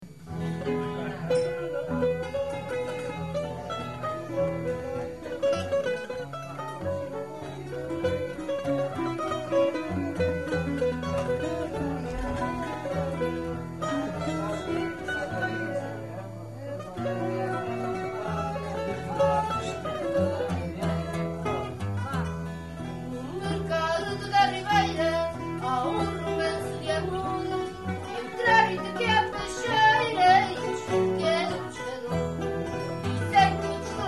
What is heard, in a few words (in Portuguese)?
fado mercado da ribeira, lisboa; portugal